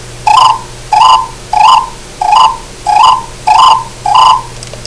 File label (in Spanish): ranita; frogs